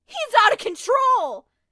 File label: town human far; Blind Drive